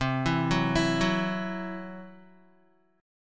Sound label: C Major Flat 5th C down Guitar; arpeggiated; CMb5